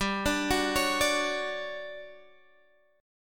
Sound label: D-Major 7th G; DM7/G arpeggiated